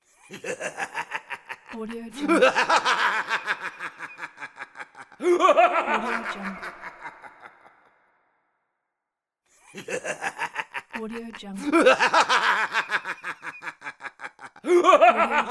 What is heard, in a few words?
mad scientist laugh